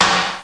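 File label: snare; gravis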